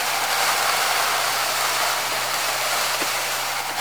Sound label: cardboard box scrape smooth loop; physics